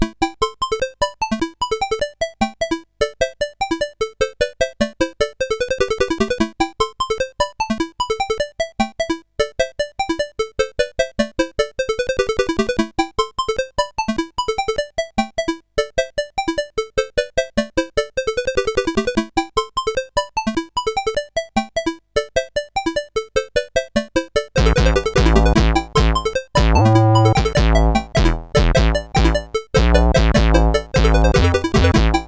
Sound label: Insects, CSG8580R5